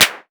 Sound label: vermona drm1 mk3 clap